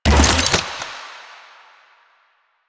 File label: ball projectile hit; Warframe; Stalker